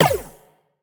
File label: wave fall